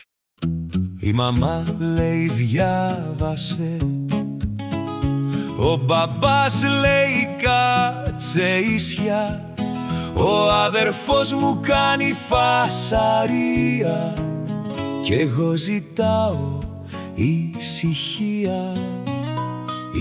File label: campfire acoustic